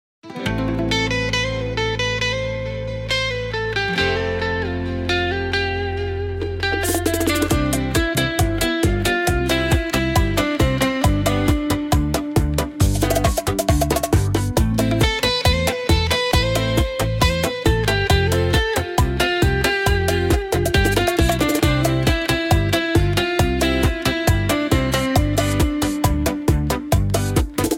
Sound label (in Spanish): Tambores del Carnaval; teneryfe bailando